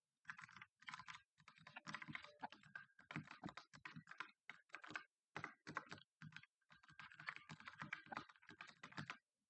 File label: Num block